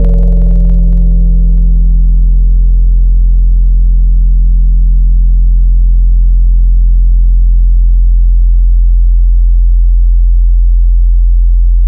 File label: Knock, Tropical Mallets; Synthetic's Serum Collection; SparkPackers